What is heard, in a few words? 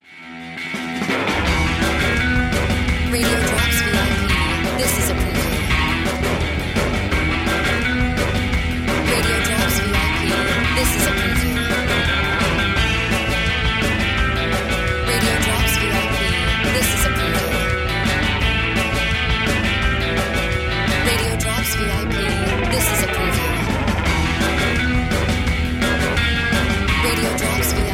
Music Bed